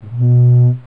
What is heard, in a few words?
horn; dungeonmaster